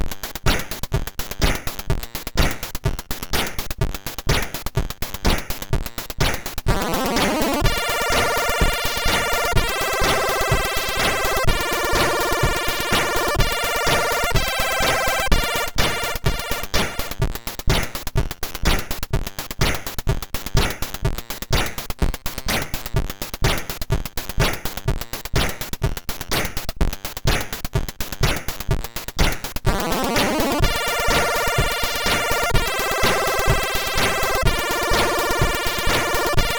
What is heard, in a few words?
House; Svetlana Tovarisch; MOS6581R3